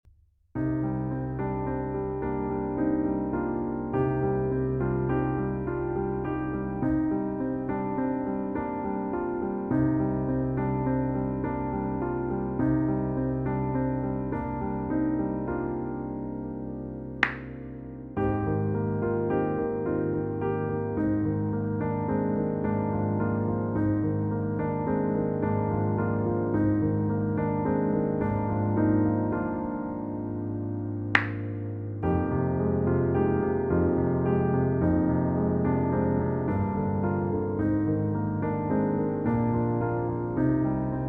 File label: PIANO mix